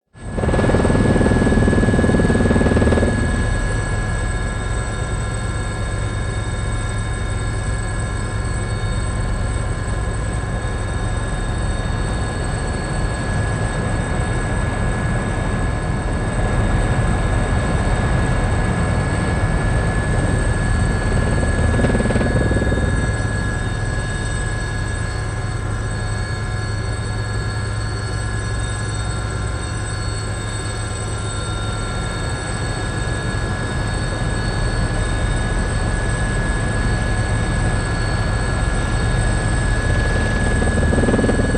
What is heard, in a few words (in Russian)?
vertolet zapis iz kabini